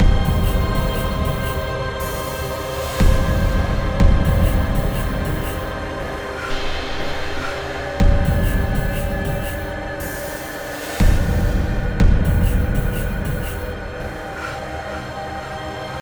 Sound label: Danger Tonal; Insanely Twisted Shadow Planet